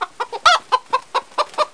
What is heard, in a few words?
SOUND CHICKN; PC Gamer 4.2; mtm2demo